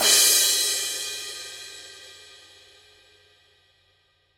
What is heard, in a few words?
17 fast crash edge; paiste 17 signature fast crash cymbal